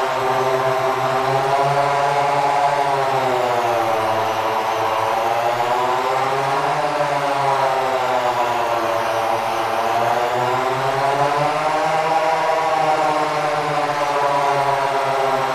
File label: blue turbine loop close; Titanfall 2